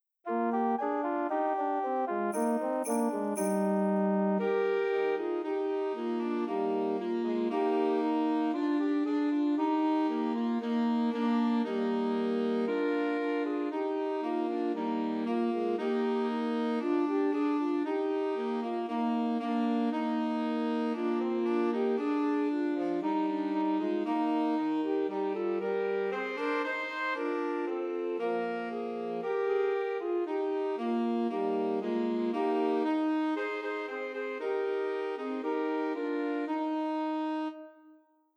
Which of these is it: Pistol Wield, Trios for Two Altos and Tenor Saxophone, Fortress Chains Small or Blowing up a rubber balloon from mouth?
Trios for Two Altos and Tenor Saxophone